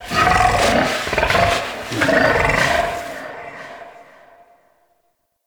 Forest Distant Medium Creature Medium Gurgle Snort, Ambience; Warframe; Grineer